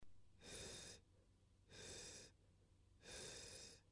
Pure falsetto

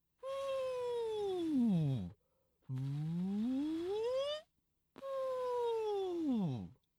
practice small voice

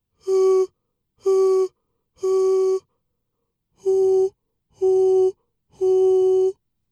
clear falsetto practice

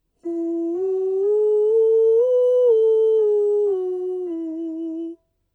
mixed voice head voice

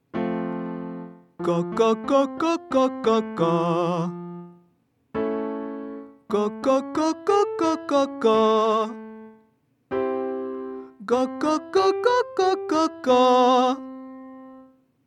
mixed voice consonants

